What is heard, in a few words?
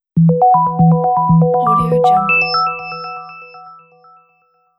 modern particle logo